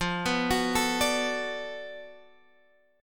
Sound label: D 6th F, chord; D6/F arpeggiated